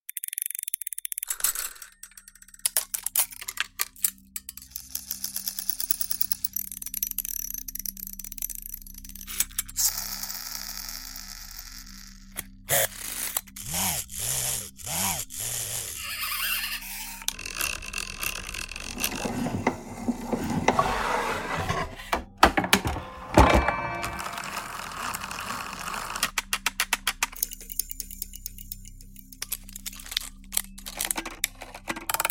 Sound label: clocks and mechanics; just sound effects